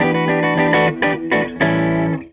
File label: Strat Guitar Loop